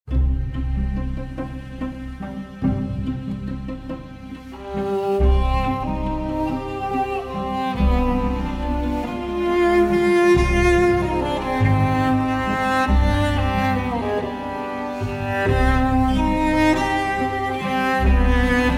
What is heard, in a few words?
Strings and Viola Solo; Minuet in C Minor